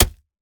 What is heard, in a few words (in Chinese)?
泥坯 破坏声